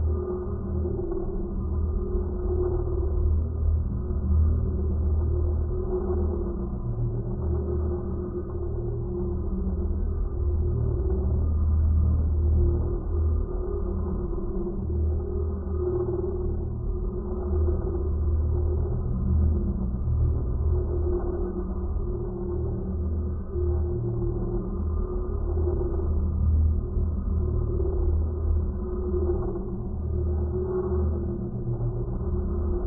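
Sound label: Ambience Dark-Opera Loop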